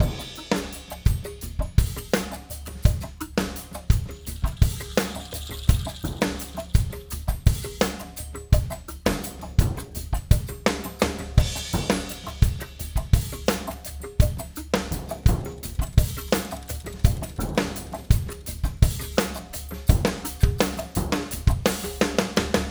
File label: DISCRETE DRUMS II